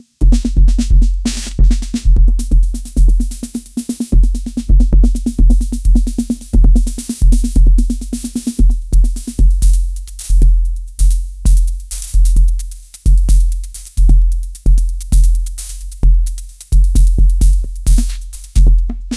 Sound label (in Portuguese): sons de bateria da roland, drum machine